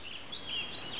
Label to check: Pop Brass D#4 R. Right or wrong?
Wrong